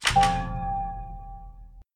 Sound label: buttons on; p00gie